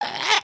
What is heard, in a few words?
Knight Lunge Grunt; emotes; Valiant Knight